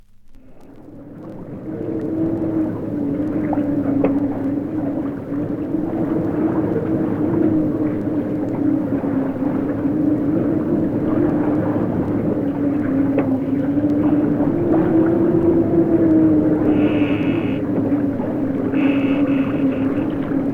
wind howling in ship's rigging

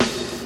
dirty snare drum b key; sample; Duration 0:01; Samplerate 44100 Hz; snare drum samples

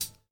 sparkle hihat sound B key; Duration 0:01; Samplerate 44100 Hz; Channels Mono; hat samples

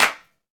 hand clap sample c key; single hits one shots

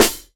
sizzle snare drum sample f key; snare-drum-samples

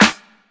snare sample g sharp key; snare drum samples; one shots

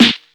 clean snare sample a sharp key

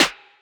airy snare sample f key; Duration 0:01; snare drum samples; Bitdepth 24

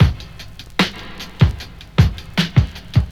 77 bpm 00s breakbeat b key

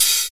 subtle open hi hat sound a sharp key

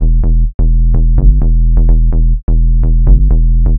Bass Tecno Loop; loops

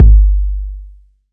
roomy kick one shot G key

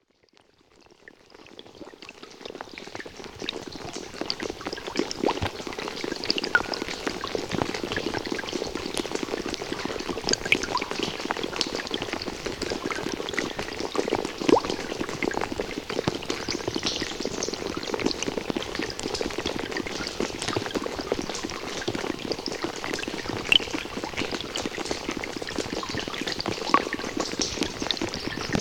snow samples - stepping and crushing

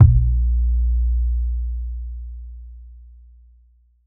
fat tr 808 synth drum kick a sharp key

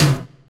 tom sound c sharp key, Loudest frequency: 1223Hz

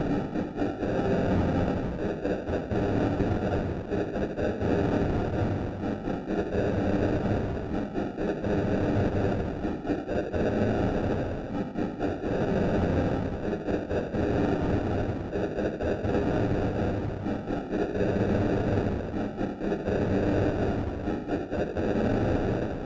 rave sequence techno robot - gm - 126; synth loops; playboy

